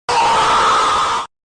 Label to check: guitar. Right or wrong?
Wrong